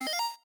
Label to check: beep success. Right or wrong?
Right